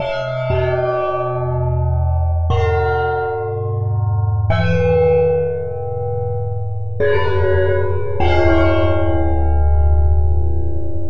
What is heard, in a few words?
cymbals